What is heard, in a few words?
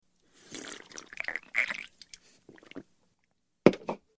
Human Slurp Beer From Mug; Hollywood Edge Foley Sound Library